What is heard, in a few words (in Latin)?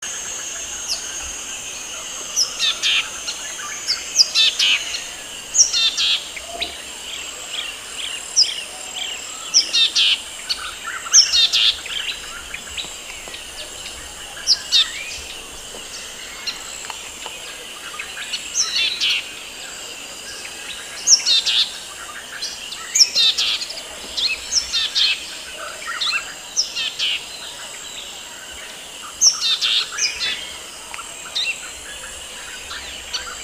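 parus albiventris, Sikory; Sikora białobrzucha; ptaki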